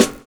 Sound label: TOM FILL; Univers Sons